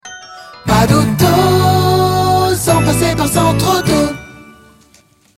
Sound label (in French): Logo Sonore; Centre Auto